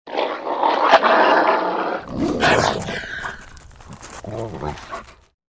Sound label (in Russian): derutsa dva volka